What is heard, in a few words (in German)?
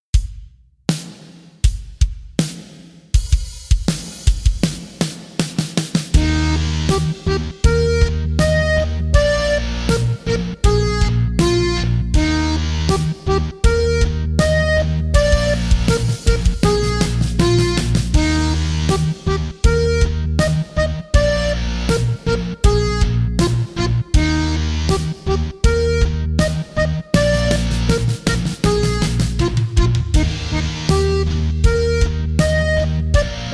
achtel rock; Knöpferl Geschichten 1